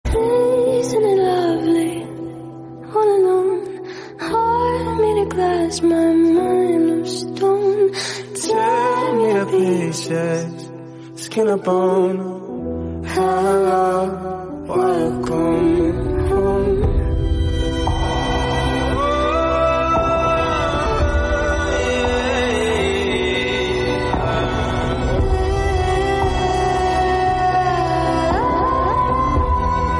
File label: marimba ringtone